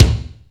crispy kick c key

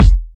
warm sounding bass drum sound e key; kick drum samples

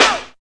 short acoustic snare sound E key

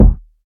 bass drum f key; kick drum samples; single hits one shots

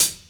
mellow hihat sound D key; hits one shots; hat samples

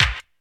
raw snare b key; one shot

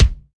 smooth kick drum sample a sharp key

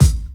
clicky kick drum A key; Duration 0:01; kick drum samples

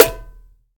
small reverb snare A key; snare drum samples

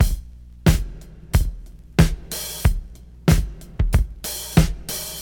92 bpm drum loop e key

